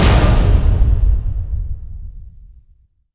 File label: Fail